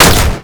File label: Semi Auto Fire 2D; Warframe; Semi Auto Rifle